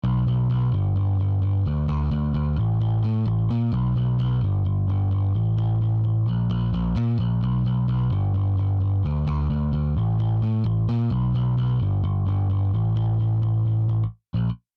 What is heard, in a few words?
Pick